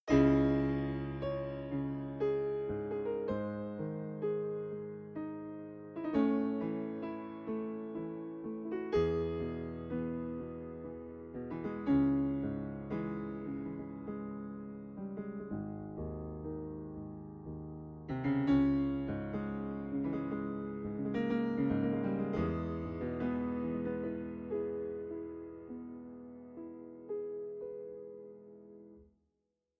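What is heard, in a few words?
close mics, Pianoteq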